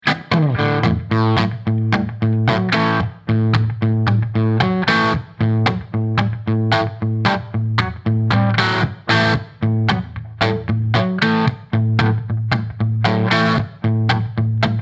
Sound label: Gibson Les Paul Marshall 1992 77 HK Rockdriver 60 MS57